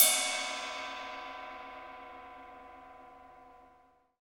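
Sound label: Cymbal Ride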